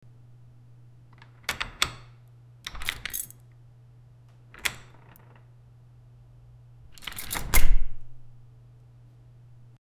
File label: the sound effect of a, lock